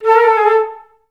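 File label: FL ORMI A3, Flute; Best Service; Peter Siedlaczek's Advanced Orchestra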